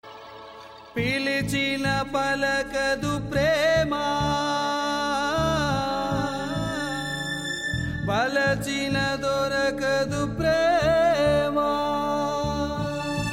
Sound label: Sad Song